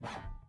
NOISE, BASS; Masterbits CLIMAX 7; PATITUCCI BS

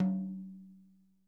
HITOM, drum

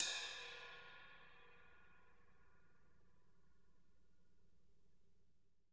ride

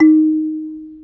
D#3 KALIMBA; AKAI S6000 CD-ROM - WorldSounds

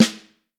Snare Drum, 5X14; Q Up Arts; Johnny.C's Vintage Snare Drum

